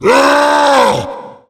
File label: tank yell